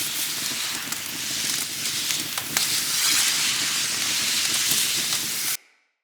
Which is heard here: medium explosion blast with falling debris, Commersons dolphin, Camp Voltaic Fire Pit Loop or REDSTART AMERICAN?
Camp Voltaic Fire Pit Loop